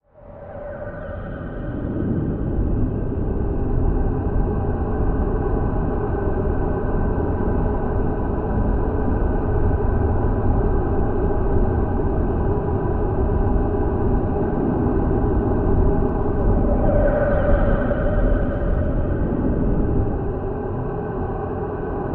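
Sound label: Crypt Deep Bubbling Tone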